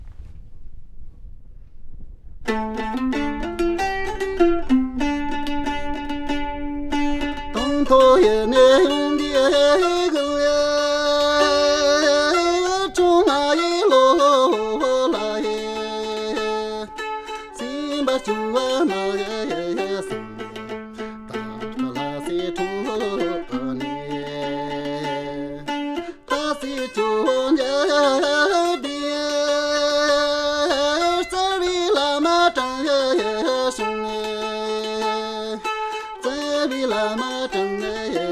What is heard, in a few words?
Dkyil 'khor Modern Folk Song